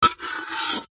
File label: foley metal aerosol can single scratch sharp object